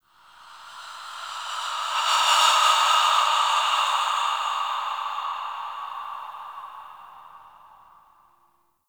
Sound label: Breaths, Ambience; Warframe; The New War; Zariman